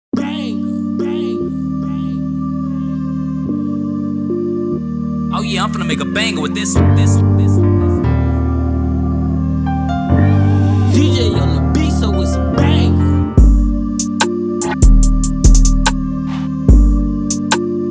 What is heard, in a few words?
INST 145BPM Gm